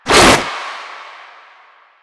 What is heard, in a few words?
Arm Attack; Warframe; Proto Infested Runner